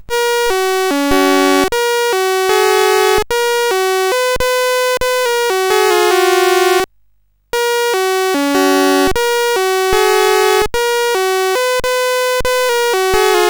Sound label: World Cup, CSG8580R5